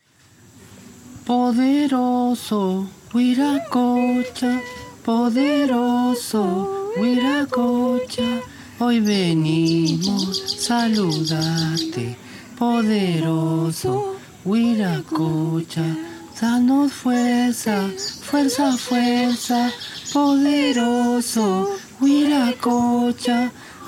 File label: power song; vira cocha